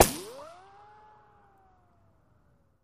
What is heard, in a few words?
Bullet Ricochet Sin